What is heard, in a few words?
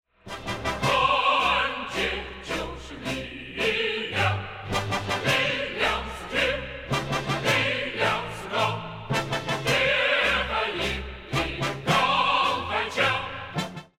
won round; music kits custom